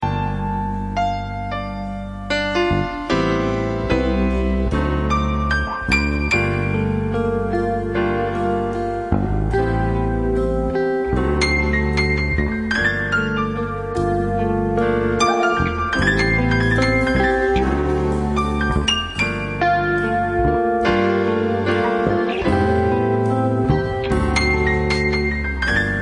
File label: Romance Blue